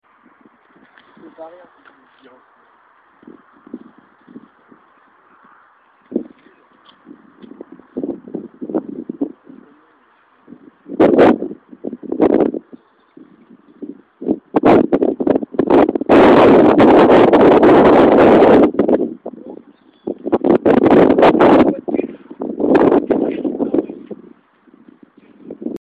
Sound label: limoges ambiances